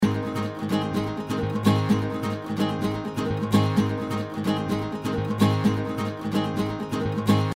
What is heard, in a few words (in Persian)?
ریتم گیتار پاپ